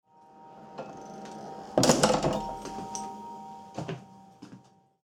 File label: door shut